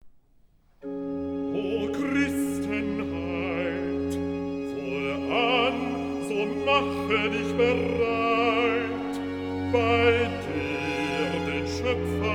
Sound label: Recitativo (B); Bach; Philippe Herreweghe; Christmas Cantatas in Leipzig; Gelobet seist du, Jesu Christ, BWV 91; O Christenheit